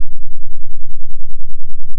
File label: fm voice single2; itakura saito; FFTimbre